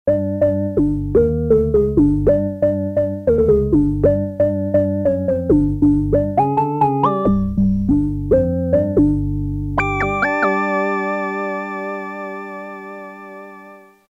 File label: Blob Tech; Roland M-DC1